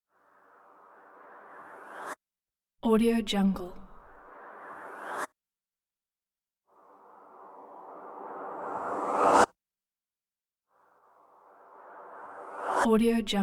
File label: wind suck backwards swooshing build up